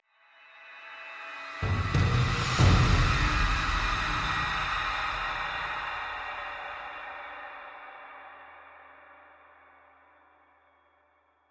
drum; twistedtreeline; lol

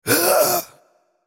dying; Lich

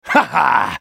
ultimate effort; Talon; en US

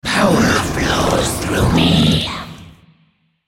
Dragon move; Shyvana

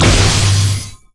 death ray; LoL; viktor skin03

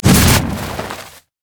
sad mummy tantrum; LoL; amumu

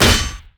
basicattack human; LoL; shyvana

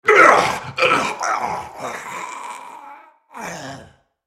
dying; Skarner; en US

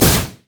teleport; Diana

